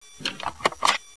tape pickup; buttons

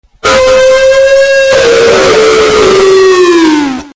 oof!

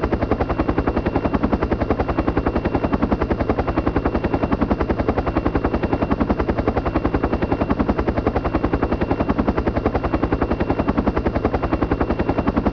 heli

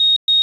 oxygen alarm

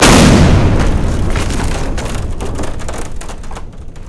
metal explode

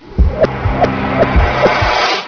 charger loop

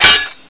hit

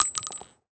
shell, weapons

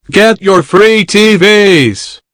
zombie voice idle

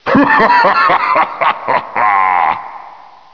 hahahahahaha

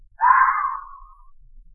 fox cry, forest trail ambient

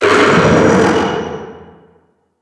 alien

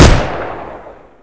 grease gun shoot

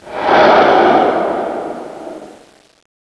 steam dream whoosh

